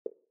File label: text Spool Sound